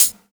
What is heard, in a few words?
HH, Dance Mega Drums; Best Service; HH-HIP